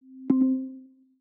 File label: begin, iOS Sounds